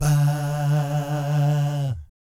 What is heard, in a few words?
VIB FX, Jazz FX; Spectrasonics; Vocal Planet; JAZZCOMBO